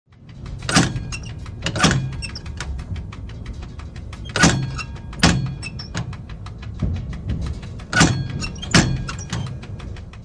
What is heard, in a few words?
Train Bell (Interior) Tram Bell Tinges From Interior; Hollywood Edge 3-D SFx